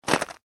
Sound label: human footstep hard snow ice; Single Footstep On Hard Icy Snow; Footsteps; McKinney Sound